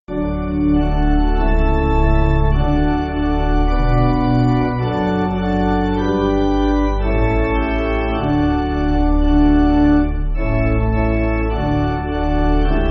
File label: Pipe Organ, church music; The Grace Of Jesus; Pilgrimssonger